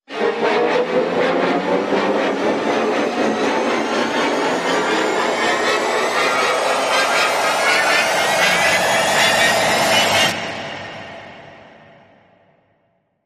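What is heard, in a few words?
Rise Rhythmic UFO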